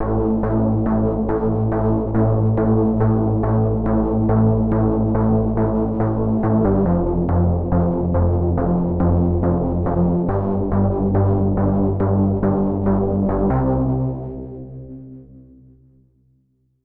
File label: HARD BASS 140 A, Hard Trance, Bass